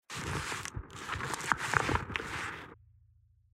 Cloth movment

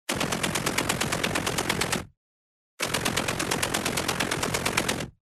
Assault rifle

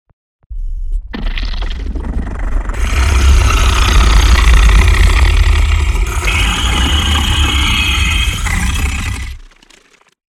Alligator under water

